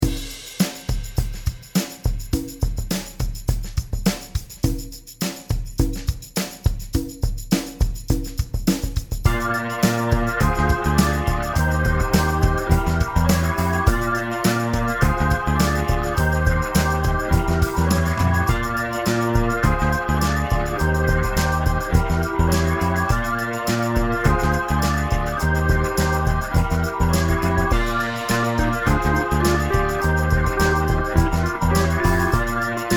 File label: Solo